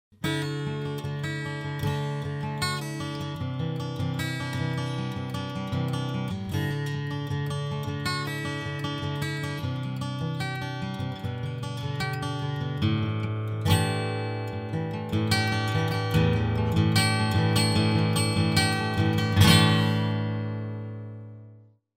major Picking

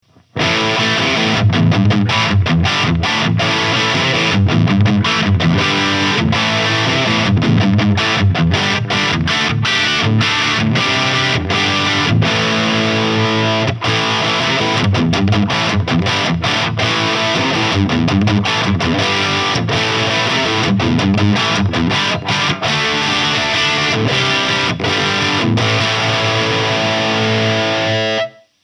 Solar Guitars E1.6AAN Crunch

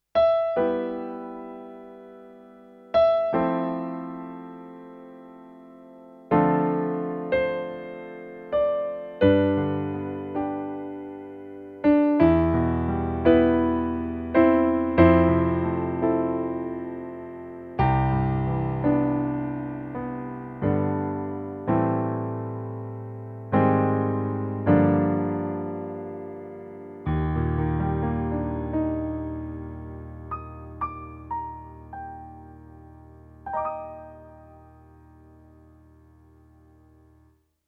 Yamaha PSR-SX600 Concert Grand

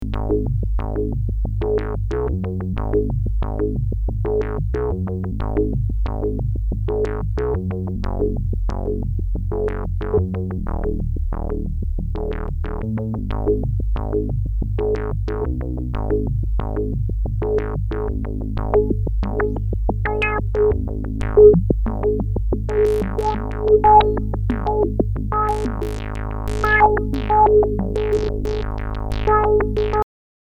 simple OSC; sys100m

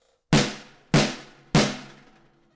Snare

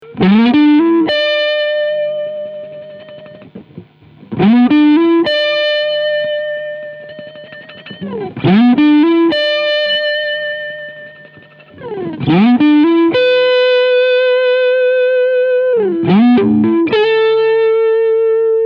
Front P90 Overdrive

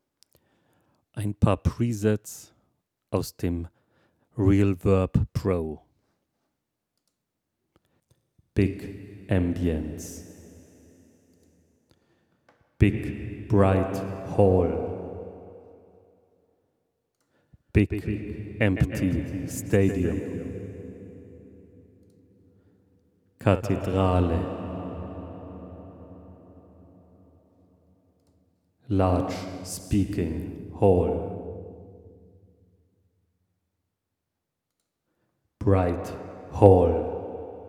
Vocal Reverb

